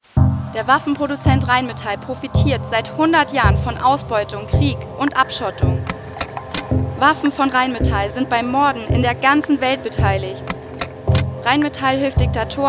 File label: Jingle